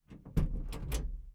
Dodge A100 Door Open Classic Interior; 1965; Pole Position

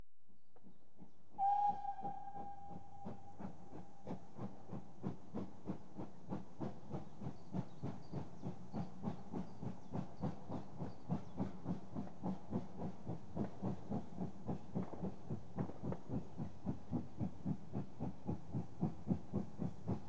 Train Passes Slowly L-R; BBC Sound Effects Library; Age Of Steam

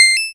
coin; Kenney's Sound Pack; Retro Sounds 2

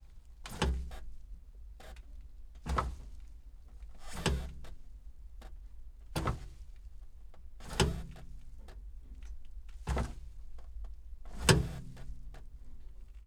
Ape P703V, Throttle; Piaggio; 1993

